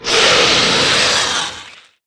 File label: spidermonster ouch